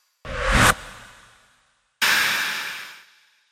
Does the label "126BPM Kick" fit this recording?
No